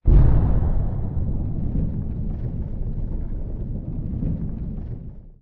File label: Nuke; Ending; GateB